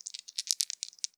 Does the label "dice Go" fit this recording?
Yes